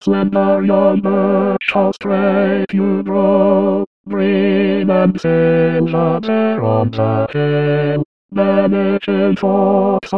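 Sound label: bass; Dvorak; Songs of Nature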